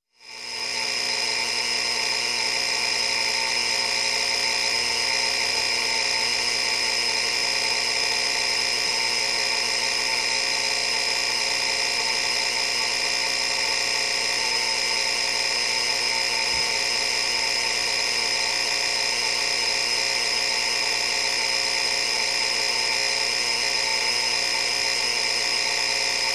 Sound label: Alarm clock mechanical